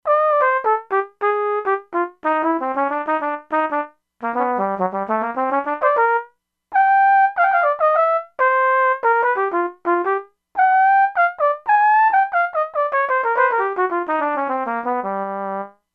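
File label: Expressive Cornet; Factory Presets; E-mu Proteus-2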